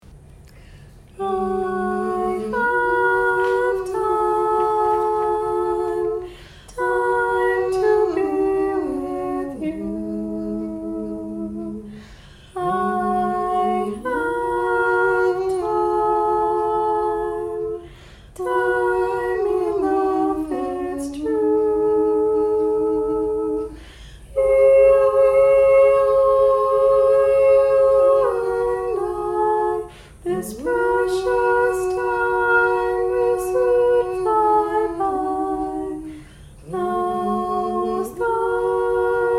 w Soprano

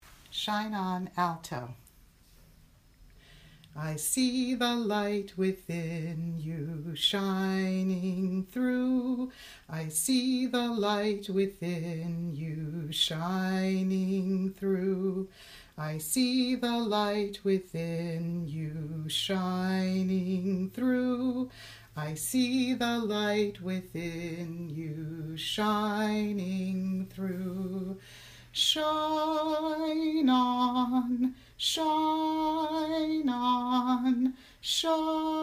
Alto

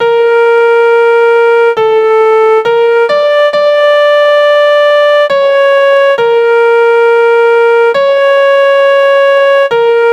Soprano Harmony

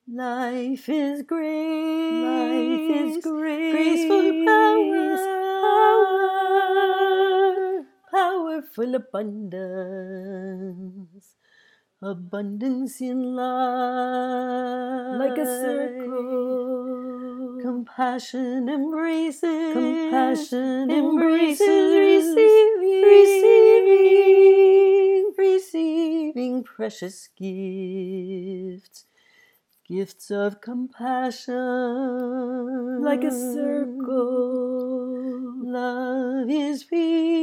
Harmony 3 vocal tracks, choir

